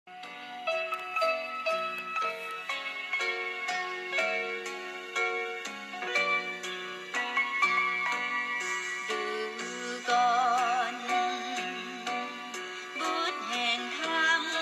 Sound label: chant lao